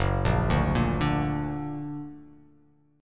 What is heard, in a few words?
Gb Augmented; 2,1,0,3,2,x,x,x; Gb+ arpeggiated